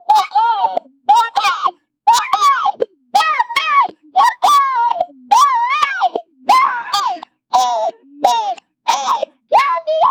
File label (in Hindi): ek comedy video ke liye, background music